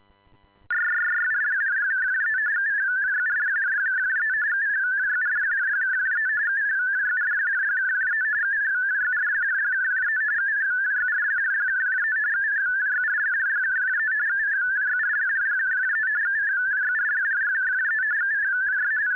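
"ALE400" calling another station without Reed Solomon identifier; ARQ-FAE 50 Bd / 8-FSK MODE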